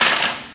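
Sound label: physics clipboard impact medium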